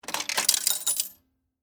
Pay Phone Lever, Technology, Telephone, Coin, Release, Lever, Coin, Dispensed, Pay, Phone; Telephone Sound Effects; BlastWave